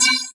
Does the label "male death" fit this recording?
No